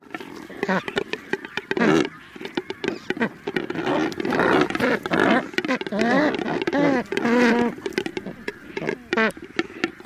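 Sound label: adult croaks and gargles at colony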